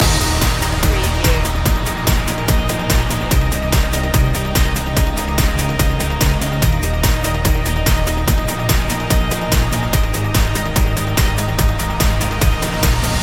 fresh energy loop

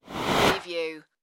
futuristic short swish

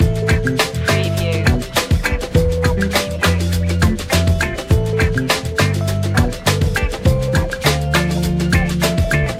get funky loop

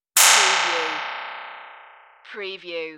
Clickie

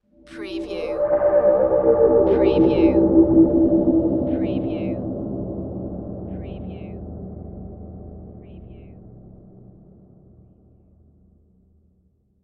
Swell Filter

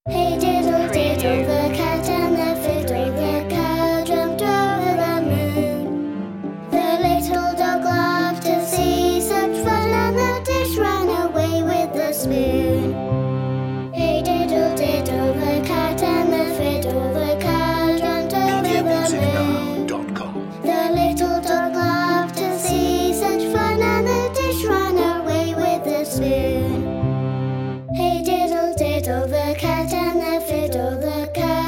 hey diddle diddle children vocals

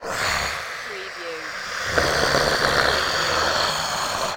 Monster Animal Sneer

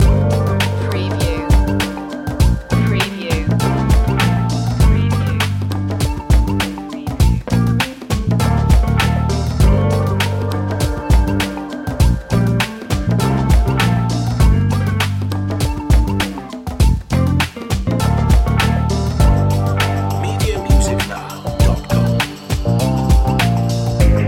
chilled loop

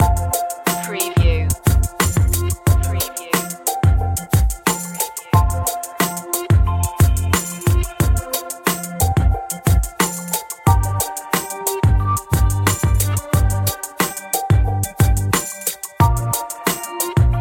electro hip hop loop

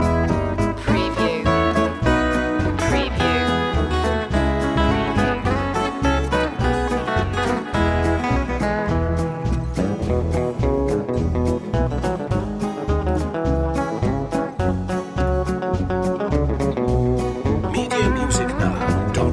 country stroll loop

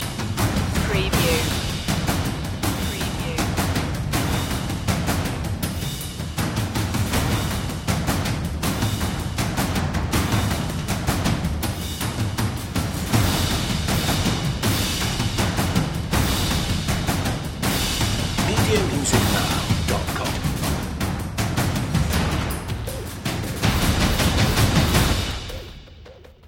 percussion only

